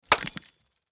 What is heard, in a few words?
Lightbulb break shatter explosion